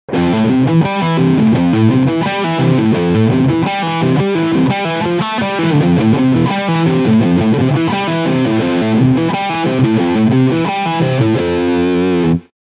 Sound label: ARPEGGIOLINES